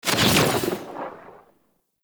Proj Explosion; Warframe; Noctua; Weapons

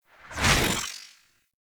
Archwing Fly By; Warframe; The New War; New War Intro Moon; Cinematics

